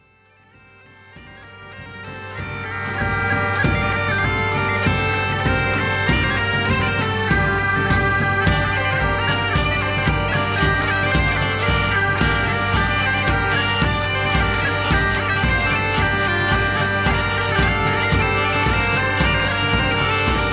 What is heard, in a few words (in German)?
Finnisches Lied